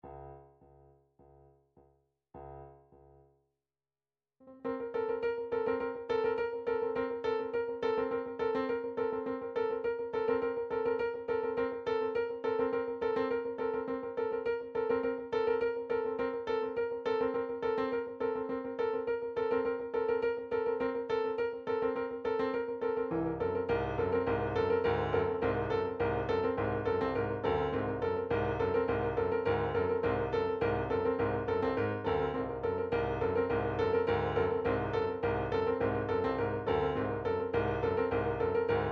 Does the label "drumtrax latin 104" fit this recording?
Yes